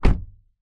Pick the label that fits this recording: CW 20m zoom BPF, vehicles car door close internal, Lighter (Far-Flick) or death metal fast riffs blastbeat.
vehicles car door close internal